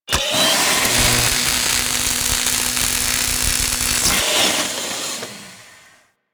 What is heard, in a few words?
Welder Work; Warframe; Venus Open World; Venus Solaris United; Fortuna